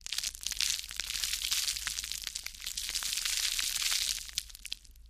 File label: Fine Debris Light